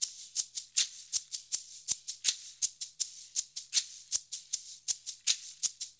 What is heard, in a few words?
80 MIX, Percussion Loops